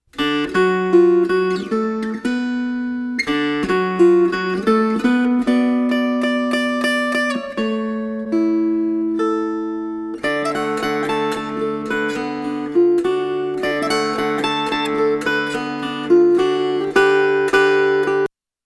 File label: Waltz